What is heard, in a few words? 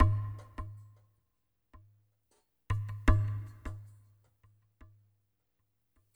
ICE BRUSH, Liquid Grooves; Spectrasonics; Liquid Grooves 1 & 2